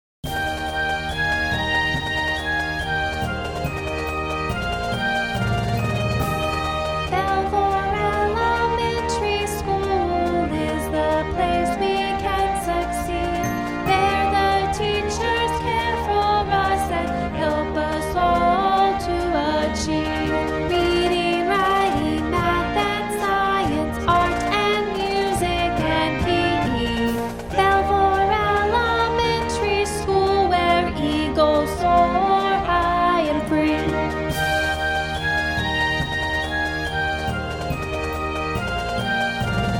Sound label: elementary school song